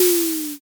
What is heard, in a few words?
shoji